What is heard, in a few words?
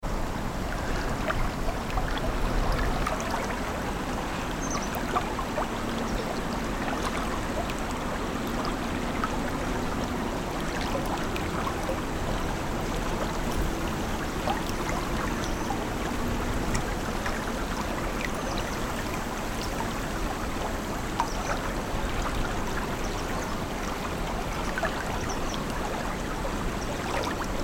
river